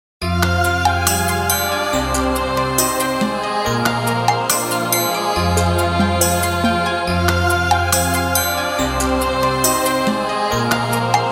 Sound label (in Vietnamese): Do lac ben yeu beat